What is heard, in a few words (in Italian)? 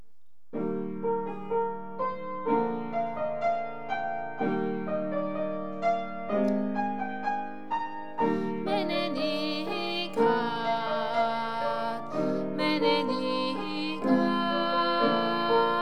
Bassi; benedicat